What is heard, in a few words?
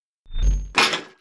Metal Part Meter hit; Lucius III; pc linux